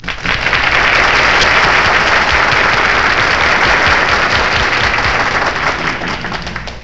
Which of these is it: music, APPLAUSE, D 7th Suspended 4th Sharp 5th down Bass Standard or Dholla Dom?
APPLAUSE